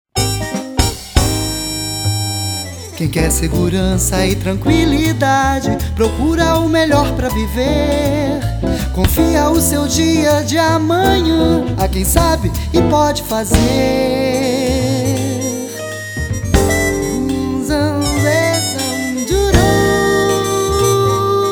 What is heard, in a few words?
Jazz